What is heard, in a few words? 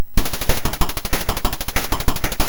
Drums, MOS6581R2